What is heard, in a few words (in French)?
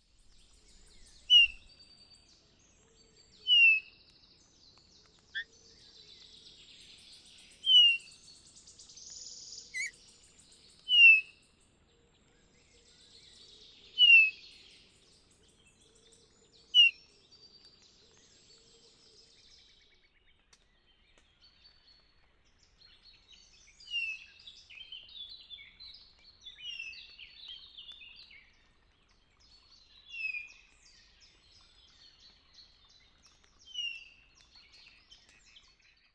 bouvreuil pivoine, oiseaux des différentes forets Vosgiennes; oiseaux de nos forets